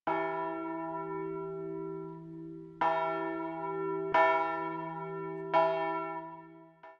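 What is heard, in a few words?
Bell E1, electronic bells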